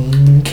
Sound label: "Mmmm"; inner demons